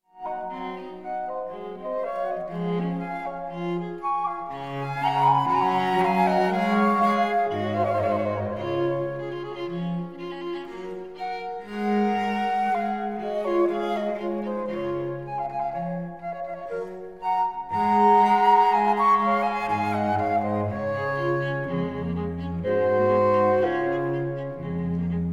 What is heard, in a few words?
quartet in c major for 2 flutes viola and cello; op 19 1